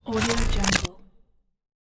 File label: mechanical rewind